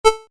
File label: Rollover, Short